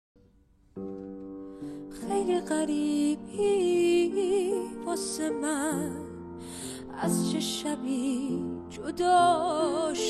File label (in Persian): دانلود آهنگ با صدای زن